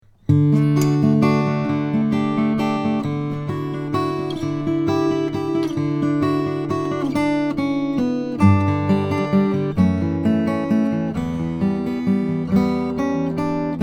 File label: bourgeois omc 150 brazilian rosewood